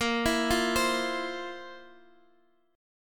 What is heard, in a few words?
F Major 7th Suspended 4th A sharp